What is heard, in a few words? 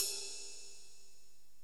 jungle ride